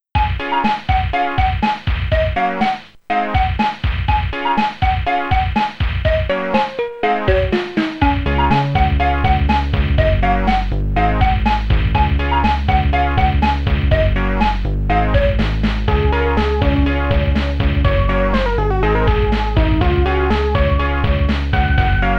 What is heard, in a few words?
London Bridge is Falling Down, Archimedes; Lemmings